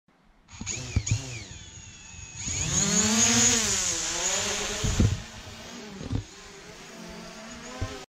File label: heli shot